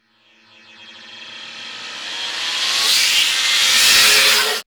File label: RIP KNIFE; Distored Reality